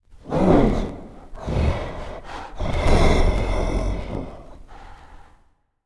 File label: Victory Monster Vox Circle; Smite; Susano